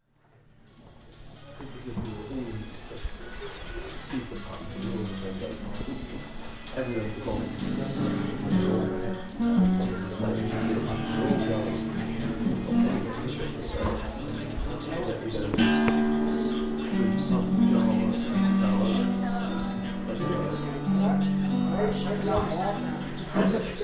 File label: guitars